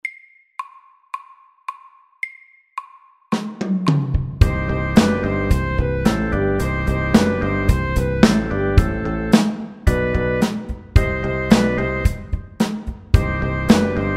Rocky poppy sax MEDIUM 110

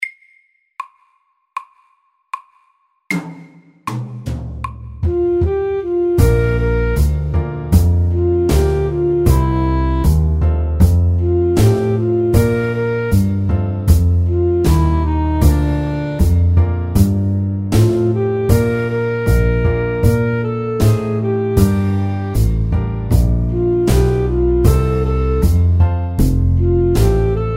Slow blues fort

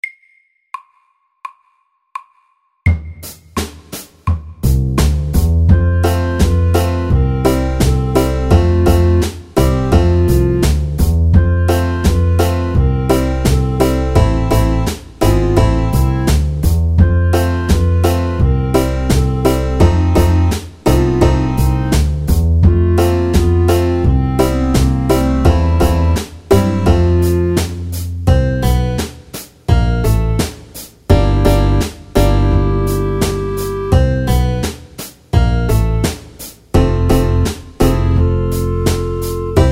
Country dance SAKTE

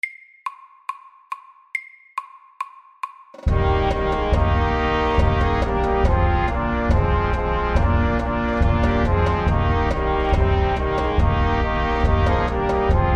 Ronde FAST 140

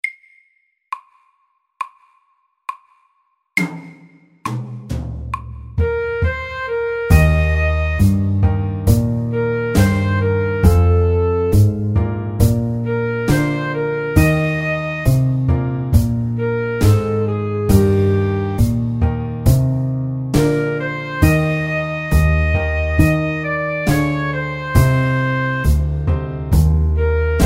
Slow blues middels

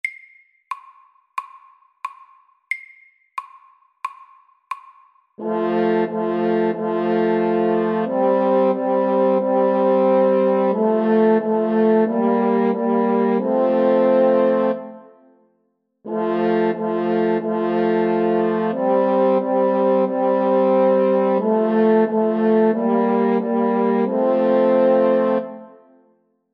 fanfare SAKTE; First fanfare

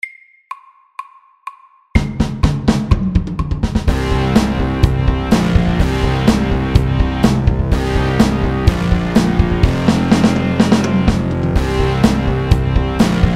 Heavy metal FAST 125

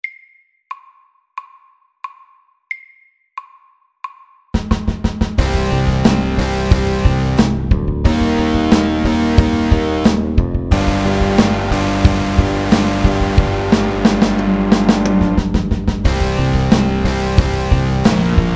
Pirate rock MEDIUM 90

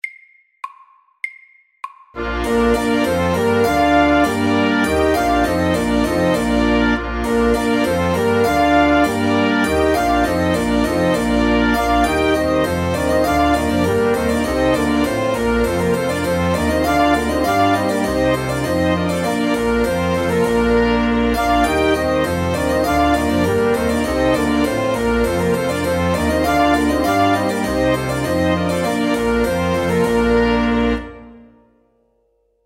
Bourree FAST 100

